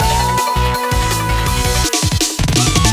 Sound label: Transition; sky rogue